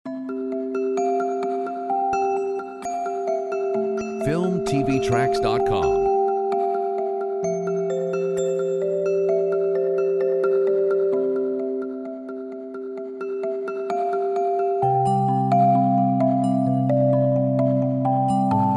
celesta

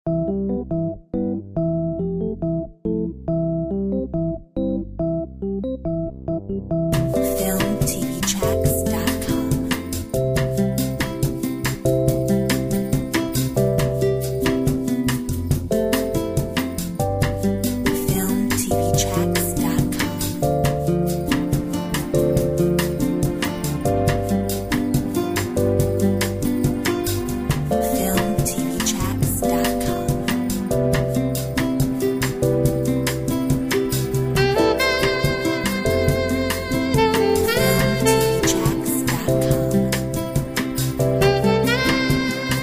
Cafe Bossa nova

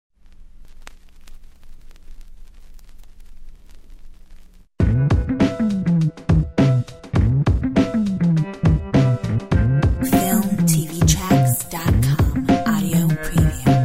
Hiphop

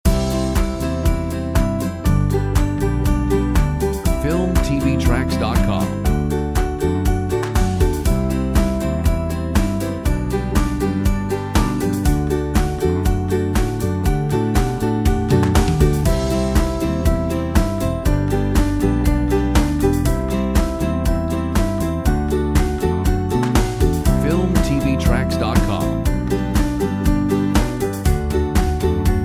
no vocal

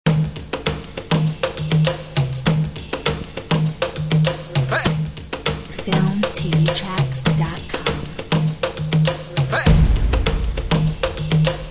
Urban drums

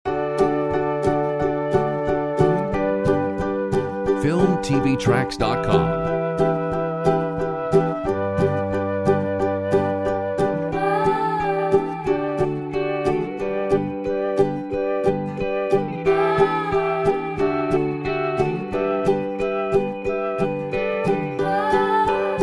drums

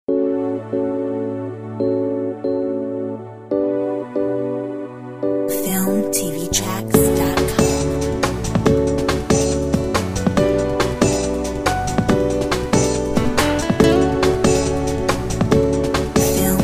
solo

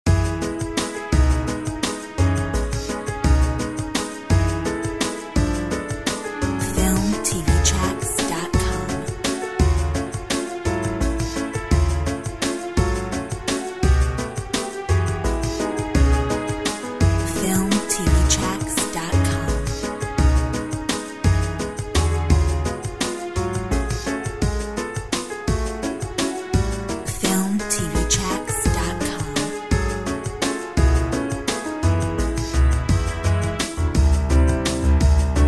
Parisian waltz

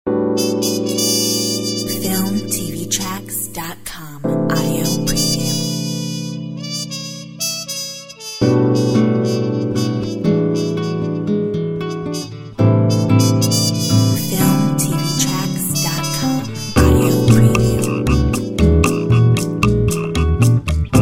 Lounge; Filmtv-tracks

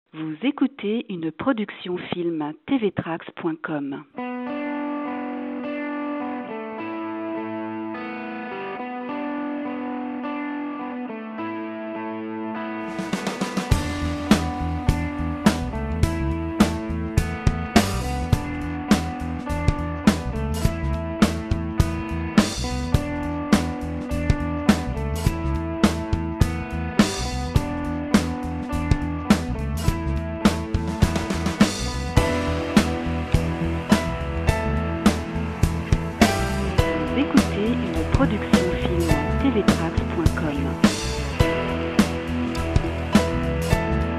college rock

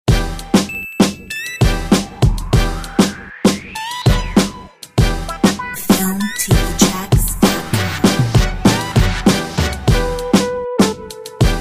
Hip Hop

